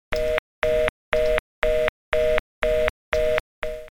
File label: Busy Signal